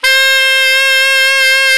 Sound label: TENOR SAX; Alpine Volksmusik